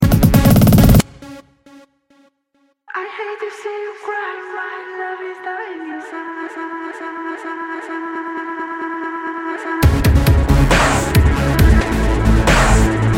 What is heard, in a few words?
dubstep